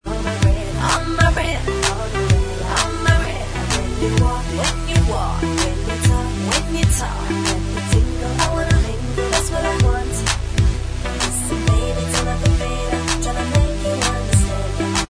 Bvox Track, backing tracks

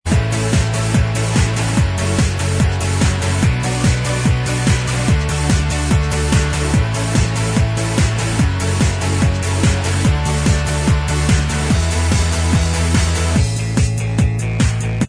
Inst

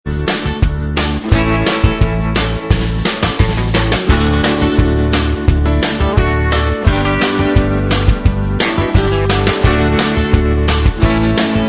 Inst Track, backing tracks

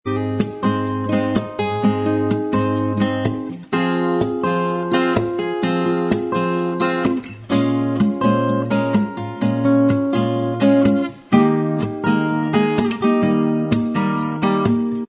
acoustic style Inst Track

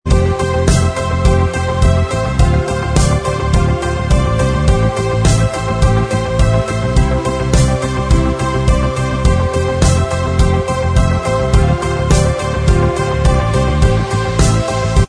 Inst Track

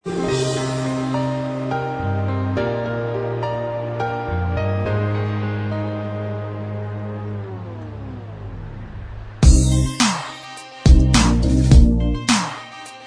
Inst Track, backing tracks

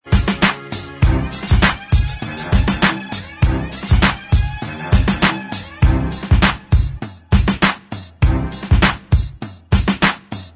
Inst Track

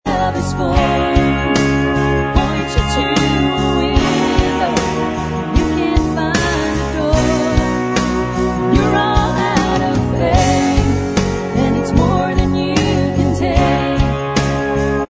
Bvox Track

